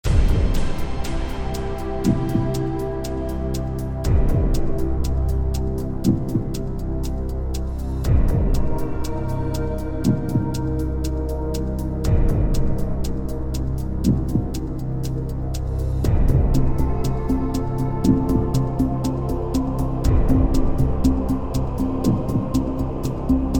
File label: Clock; Millionaire Soundtrack; US Clock (2008)